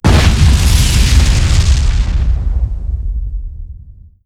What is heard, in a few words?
Explosion Large Bright